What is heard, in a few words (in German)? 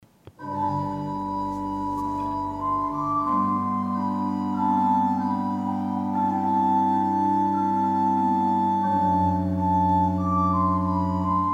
Breil, gebrauchtorgel